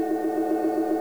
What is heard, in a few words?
cheesy computer hum